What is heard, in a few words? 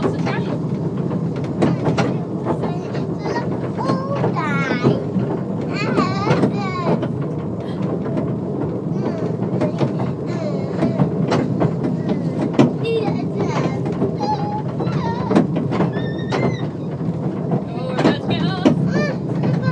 sound of clattering carriages and excited children on the santa special steam train